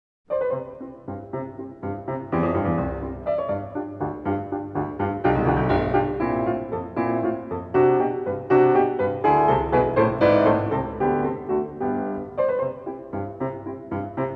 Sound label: 33 Variations on a Waltz, C major, Piano; Theme; Ludwig van Beethoven - Complete Works [Brilliant Classics 100 CD Box]; Piano Variations IV; 33 Variations on a Waltz by Diabelli in C major Op. 120